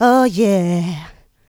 Vocals, Adlibs; Houseworx

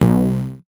VocoBit Noise, 8-bit; musicradar; 8-bit bonanza

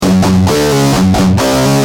G Jam, Guitar; Industrial

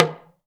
TALK DRM, Heart of Africa; Spectrasonics

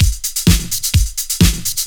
Clubhouse Loop 128 BPM

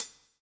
COPS STK; Spectrasonics - Backbeat

